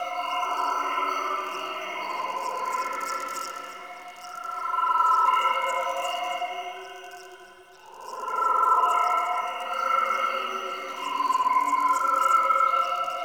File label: Critter Ambient Stereo Loop; Props; Warframe; Tau; Ambience